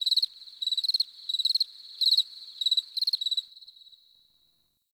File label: Insect Night; Ambience; Warframe; Eidolon Plains